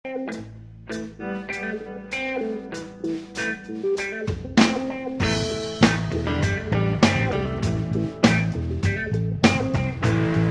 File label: ring machine